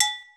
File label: Bells